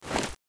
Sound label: mine trigger draw, weapons